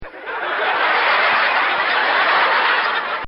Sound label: Laugh Track; Human